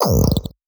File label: Squelch; LD42 SARD inc new ship